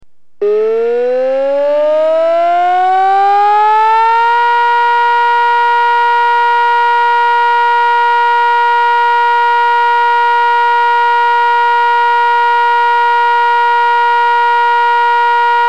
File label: 475hz steady tone; to use for stand down